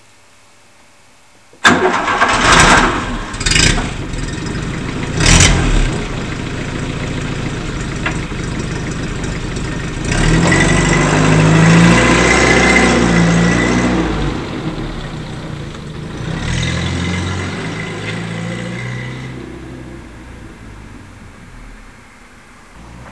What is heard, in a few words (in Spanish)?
R10 arranca sale y pasa